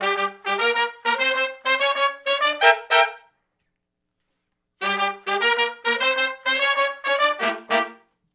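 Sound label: R&B Riff (Gmin) unison to harmony, Horncraft; Sony Creative Software; Crimson Blue and Fabulous Horncraft 4 RnB; Horncraft for R&B; Sections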